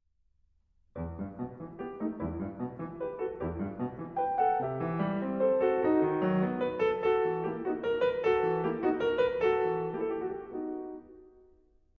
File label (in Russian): Соната ми минор 1 ч. главная тема